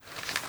cloth rub; mg43; tacz; RFP v1.0.0 beta2

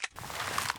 rattle; tacz; RFP v1.0.0 beta2

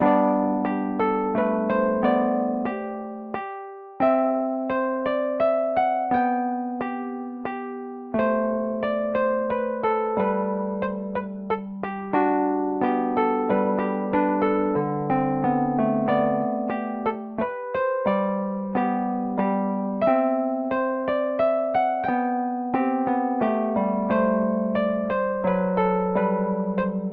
minuet; wine fox jk; yes steve model

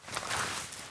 cloth rub; mg43; tacz; RFP v1.0.0 beta2

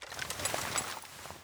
pull, rpg26; tacz; RFP v1.0.0 beta2

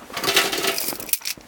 bulletchain pull; tacz; RFP v1.0.0 beta2

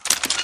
open cover, dshkm; tacz; RFP v1.0.0 beta2

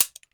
dry fire, rpg26; tacz; RFP v1.0.0 beta2

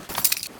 bulletchain rattle, dshkm; tacz; RFP v1.0.0 beta2

